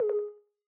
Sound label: User Account Control; Longhorn 7ista Sound Scheme by David Mounder